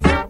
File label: pump up the bass